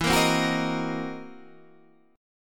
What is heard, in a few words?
C 7th Sharp 9th Flat 5th E